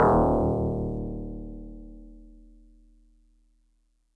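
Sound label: Tubular Bells DX100 D sharp 1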